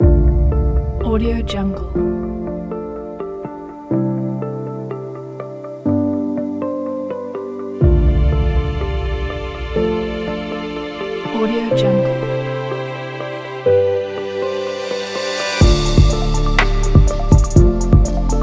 ambient calm abstract

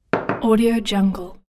glass on table

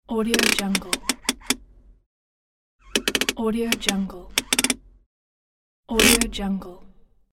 handbrake; nsfx

